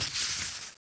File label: paging sound short; PanzerE; Desert; CD-Gamer 1999-10